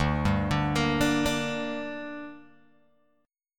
D Suspended 4th Sharp 5th D down Guitar Open G, chord